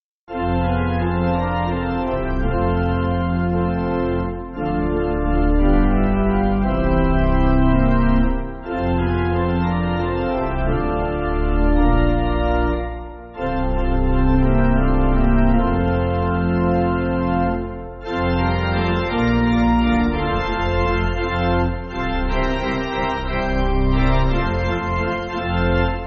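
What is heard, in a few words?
Pipe Organ, church backing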